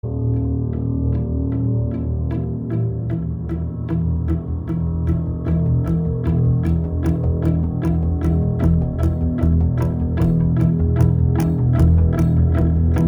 electronic music; Mr. Robot, Vol. 5

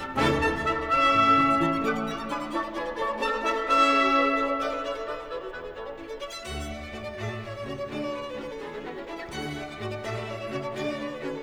Pulcinella, ballet with song, orchestra, Allegro

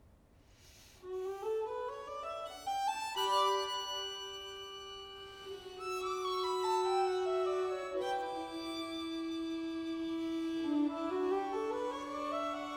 String Quartet

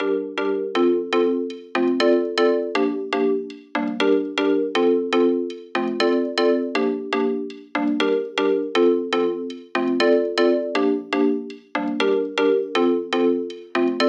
lobster rave mix with added ambience

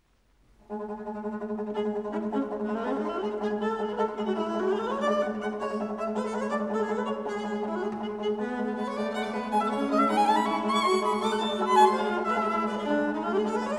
String Quartet, C major, Allegro molto; chandos

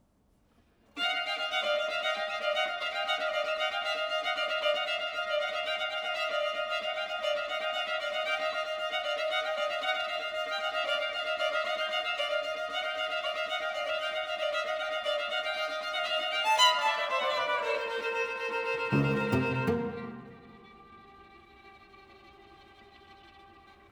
String Quartet; 10 Preludes (String Quartet No. 1)

